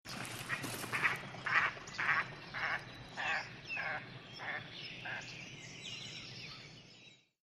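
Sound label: Mallard Single Bird, ducks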